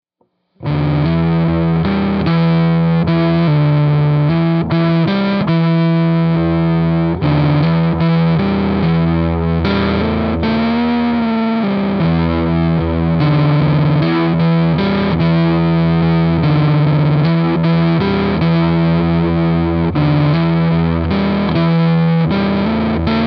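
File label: fuzz neck to bridge, pickups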